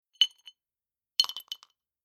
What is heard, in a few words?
Bar Two Ice Cubes Dropped Into Empty Glass Clunking Rattle Two Parts; Drink Mixing Sound Effects